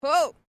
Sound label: FEMALE EXCLAIM; Female vocal sounds; Exclamations; Sound Effects:Voice; Foundation3